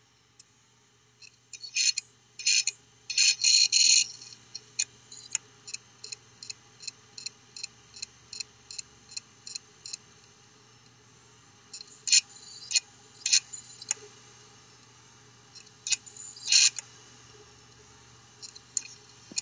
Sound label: scratch renzoku abnormal sound